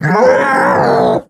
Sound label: die; The Monster Plane; kolmods death